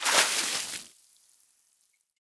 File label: waterbucket splash; Rust